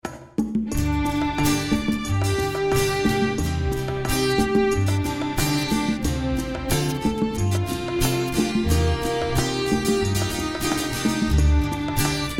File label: Instrumental